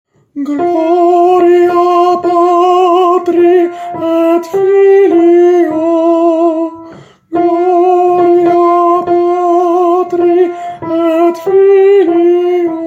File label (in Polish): TENOR; Gloria Patri